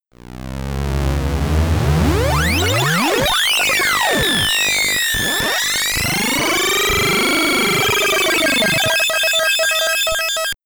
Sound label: Name Entry (SID Stereo)